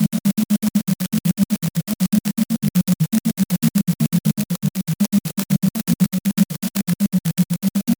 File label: Aether Keys